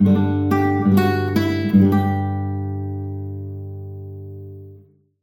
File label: Room Reverb Nylon String Guitar Wet; Echo Chamber; Echo Chamber is a stereo reverb and delay plug-in; Syntheway